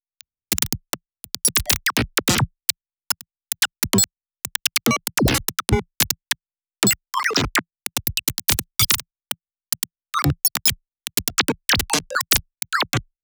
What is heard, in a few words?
squishy perc